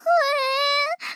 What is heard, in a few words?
sob